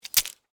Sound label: Scorpion Tail Snap, Stinger, Attack; Animal; Arachnid, Insect, Bug; Scorpion Sound Effects